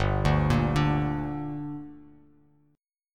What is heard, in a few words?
G Minor G, Bass Standard; arpeggiated; JGuitar